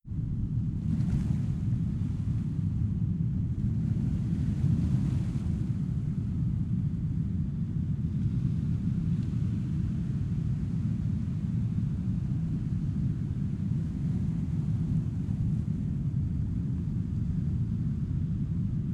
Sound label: Ocean Surf Underwater